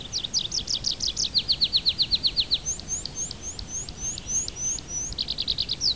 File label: Anthus trivialis